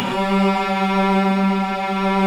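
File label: Cello D; AKAI S-Series CD-ROM Sound Library VOL-2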